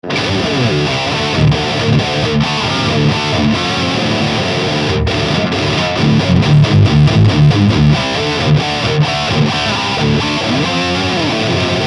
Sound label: High Gain